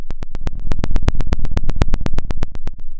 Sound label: Sound of a fire crackling